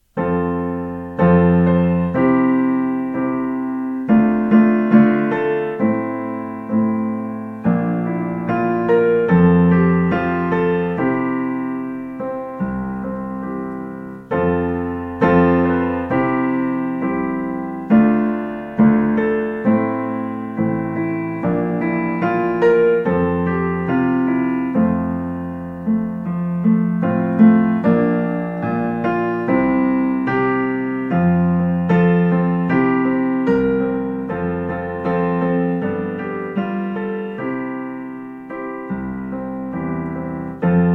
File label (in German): Irischer Segenswunsch instrumental